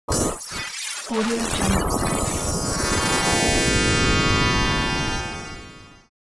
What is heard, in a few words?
alien beam tone